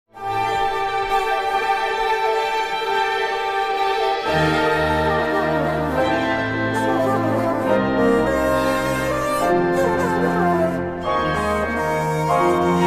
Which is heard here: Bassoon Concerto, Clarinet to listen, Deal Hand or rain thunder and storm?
Bassoon Concerto